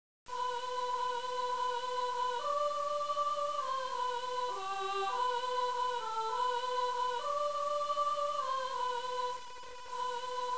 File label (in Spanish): el mensaje de los angeles sopranos